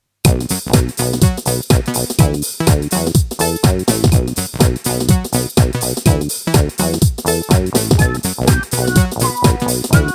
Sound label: SH-1; Eine kleine Hausmusik